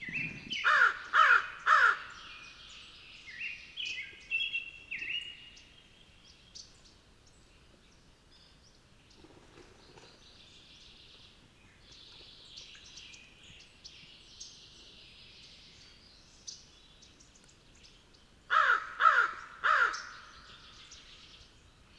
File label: American Crow Corvus brachyrhynchos